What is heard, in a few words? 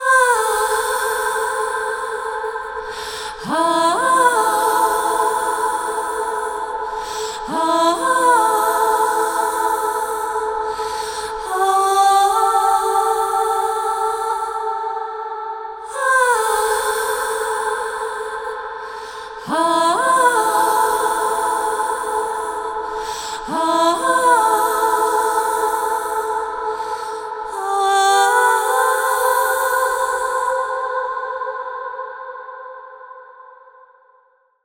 Harmony Ah Vocals - Am 120Bpm (WET); Construction Kits; Ghosthack; SkyRealm; Kit 05 - Heart Of Fire